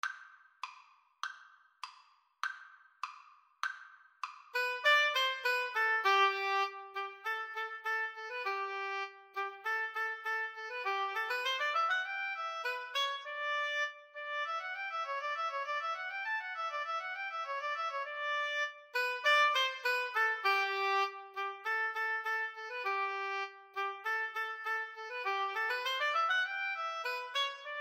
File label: Clarinet-Oboe Duet